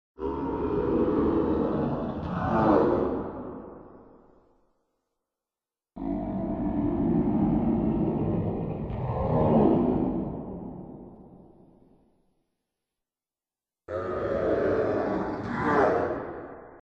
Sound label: scream; Monster Noises!; RSVP VOICES; Audio Network